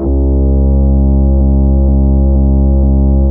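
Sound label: organ bite C3, Fairlight CMI IIe, keyboards; Keyboards2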